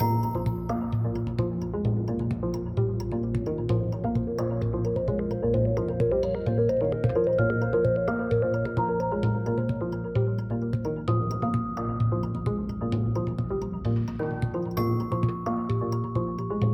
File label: rhythm loop; soundtracks; vikings